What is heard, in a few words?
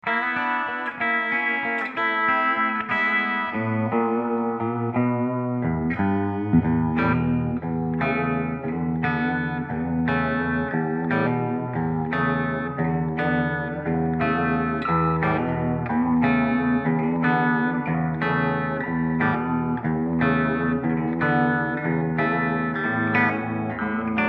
vibrato, tube vibe sounds